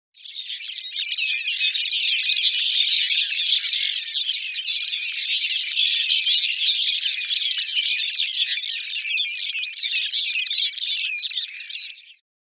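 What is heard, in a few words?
podorozhnik golos, Lapland longspur voice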